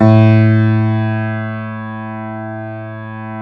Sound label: JAZZ A0, Keyboards Acoustic; USB Soundscan vol.17